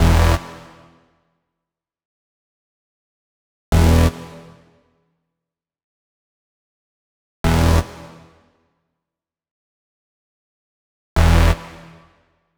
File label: 129 Em Synths, Complex Electro Synths; Prime Loops; Arena